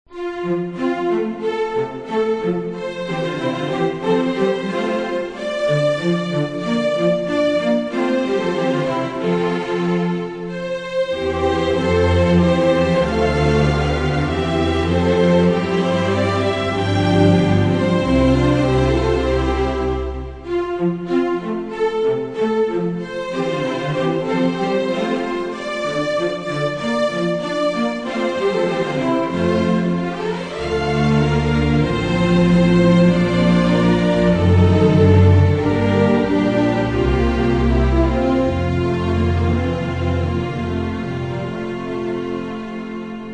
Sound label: Orchestra